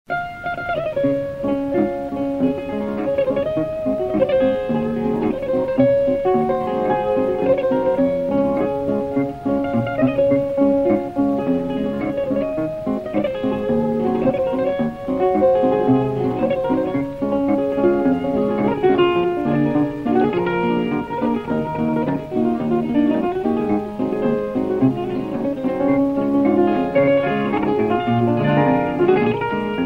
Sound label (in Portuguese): Marcha em ré maior